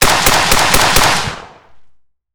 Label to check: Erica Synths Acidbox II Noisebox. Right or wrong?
Wrong